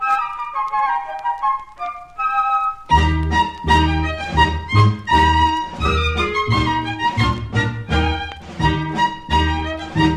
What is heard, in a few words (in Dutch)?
Zeeuwse Rei, Pays-Bas